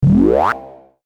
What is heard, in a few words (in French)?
MANETTE; MOBICLIC161